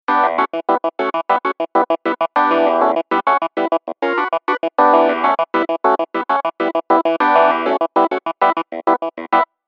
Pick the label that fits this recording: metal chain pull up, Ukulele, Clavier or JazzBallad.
Clavier